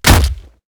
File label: flesh; kick